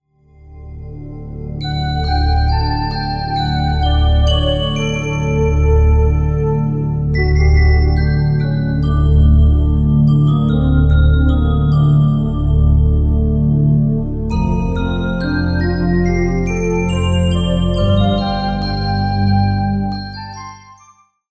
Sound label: Roland D50; syntezatory; Fantasia